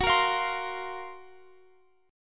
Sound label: F sharp Major Sharp 11th F sharp, Guitar Open F; F#M#11 strummed; x,x,18,17,20,19; chord shape